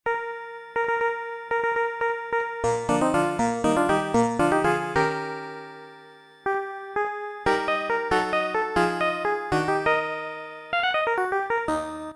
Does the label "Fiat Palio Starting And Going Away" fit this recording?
No